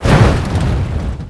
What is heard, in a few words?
hit snow; WhiteOut